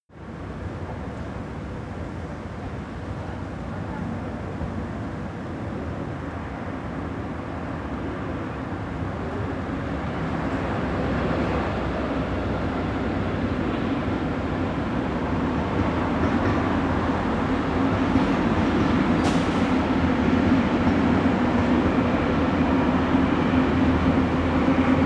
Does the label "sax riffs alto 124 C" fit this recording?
No